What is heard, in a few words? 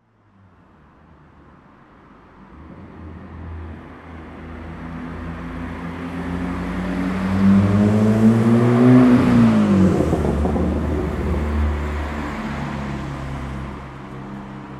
vehicles cars pass x2 noisy exhaust; zapsplat